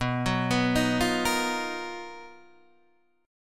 B Major Sharp 11th, Guitar, Standard; 7,9,9,8,6,6; arpeggiated; capo=6

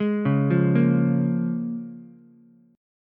A sharp Minor 7th A sharp Ukulele, chord; arpeggiated

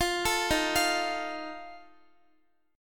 Eb Suspended 2nd Flat 5th, Guitar; Ebsus2b5; arpeggiated

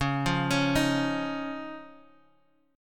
Db Major Add 9th, Guitar Open G

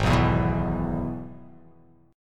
Minor Sharp 5th; Gsharp; 4,2,2,4,4,x,x,x

